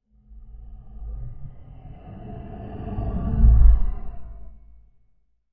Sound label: Animal Breath; Eerie And Lowered, With Close Rumble. Use For Monster.